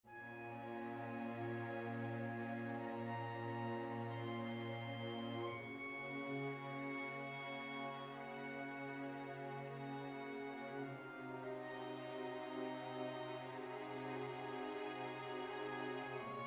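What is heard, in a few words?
orchestra bell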